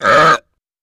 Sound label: Belch Loud; belching